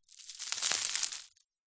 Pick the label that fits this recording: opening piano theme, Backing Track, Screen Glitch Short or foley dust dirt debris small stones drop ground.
foley dust dirt debris small stones drop ground